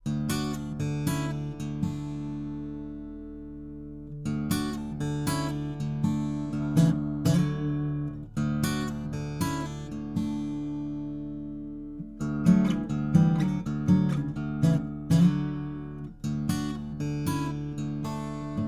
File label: picking, fame pro series sd-100